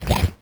slime hit; Crypt of the NecroDancer

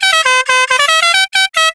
horn; TrackMania Nations Forever; Skins

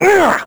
dorian hammer; Crypt of the NecroDancer

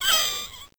bat attack; Crypt of the NecroDancer

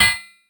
hammer; hephaestus; Crypt of the NecroDancer

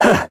dig; Crypt of the NecroDancer

